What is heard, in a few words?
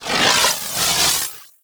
Crewship Missile Swarm; Weapons; Warframe; RailJack; Corpus Crew Ship